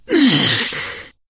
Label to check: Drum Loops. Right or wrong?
Wrong